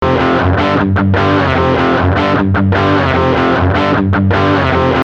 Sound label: overdrive